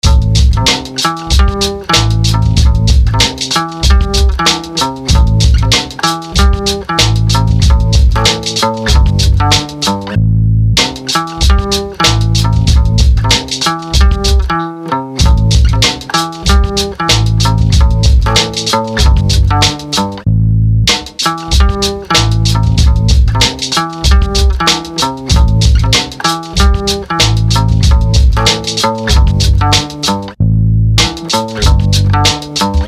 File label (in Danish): endnu mere hiphop beat